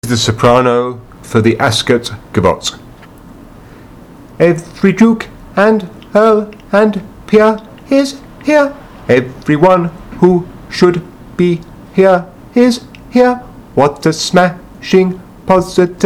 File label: soprano; My Fair Lady